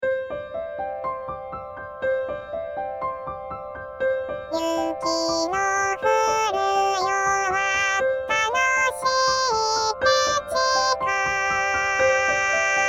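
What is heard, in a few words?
female soprano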